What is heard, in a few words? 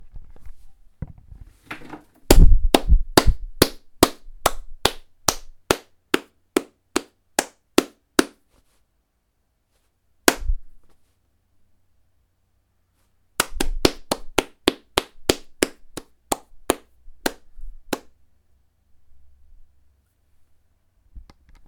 Single Hand Clapping Klatschen Einer Person